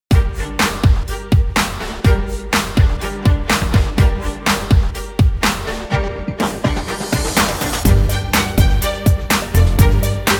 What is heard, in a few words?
bgm